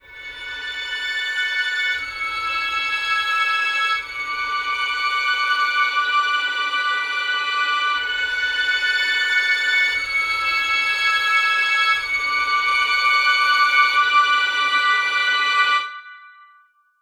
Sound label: Twisted Strings, 120bpm